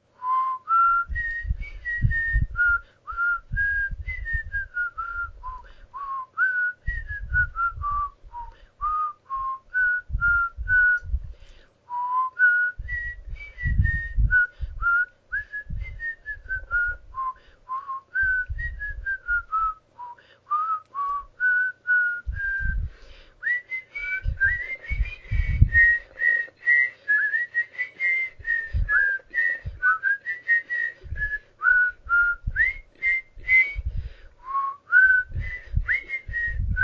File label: whistle